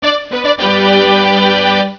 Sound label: HORSE RAC; Canon CameraWindow